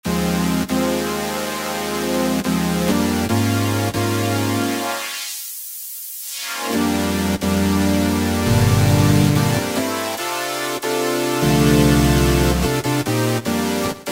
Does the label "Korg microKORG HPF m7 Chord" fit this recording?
Yes